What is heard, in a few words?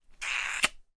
human kissing